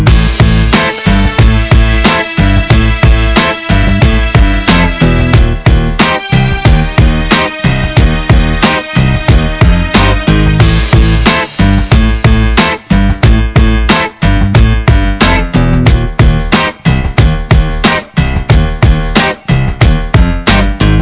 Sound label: da loop